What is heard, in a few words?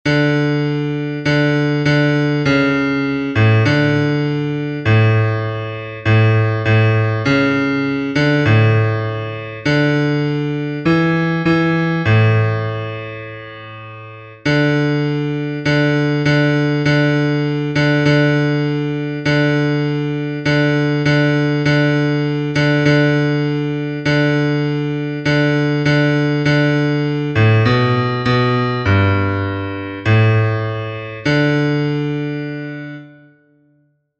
Bass